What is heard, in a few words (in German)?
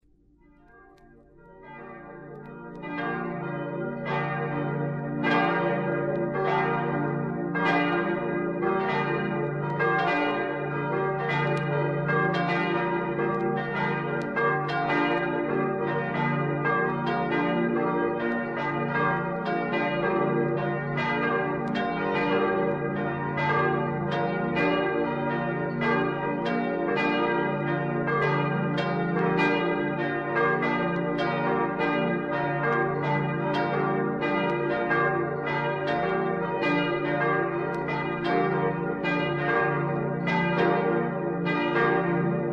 glocken st nicolai